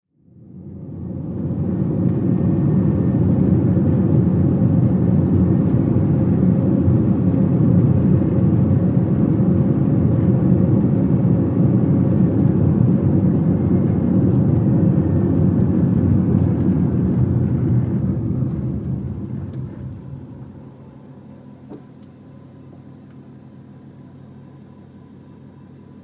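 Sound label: Small Car At 25 35 Mph, compact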